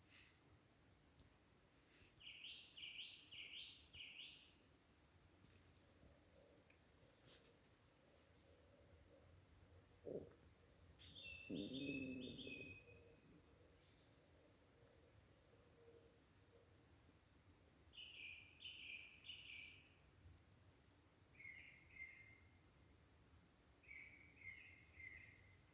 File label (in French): Ambiance; gue de la chaux